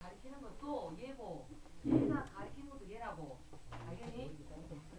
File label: frog